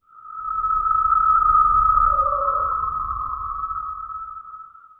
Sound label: fantasy sky atmosphere, clouds